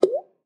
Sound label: Single Water Drop